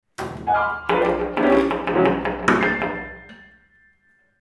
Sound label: improv jingle